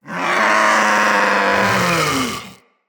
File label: Vocal Death; Characters; Hunting Critters; Vulpaphyla